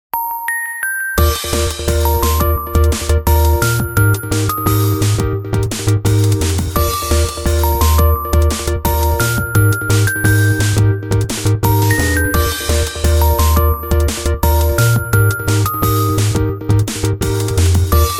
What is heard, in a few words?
sweets magic